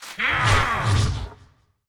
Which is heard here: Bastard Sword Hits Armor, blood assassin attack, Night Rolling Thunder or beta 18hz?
blood assassin attack